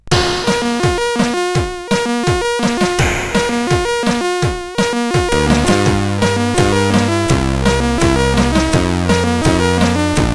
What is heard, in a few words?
Intro Tune, CSG8580R5